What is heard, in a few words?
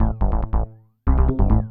SNTH BASS ELCTR 140 A, Techno